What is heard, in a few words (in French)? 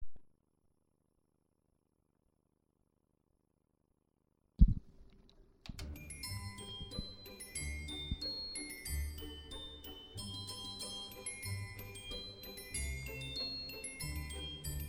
choeur esclaves